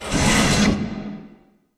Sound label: pod door open slide; Titanfall 2